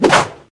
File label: Bird Shot; Angry Birds